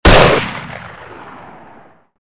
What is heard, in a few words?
ak74 single